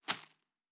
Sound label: Leaf Walk Surface, Footsteps; Survival Game Sounds - Lite Edition